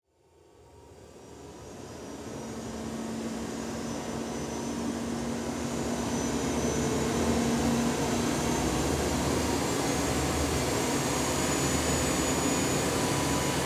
Military jet airplane engine starting idling for a while; aircraft